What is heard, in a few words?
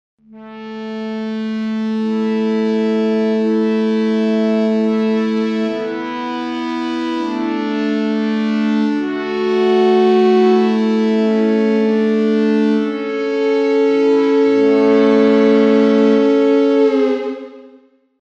ebow